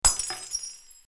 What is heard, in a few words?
smash